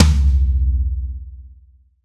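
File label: Reg. Floor Tom, Roland BK-5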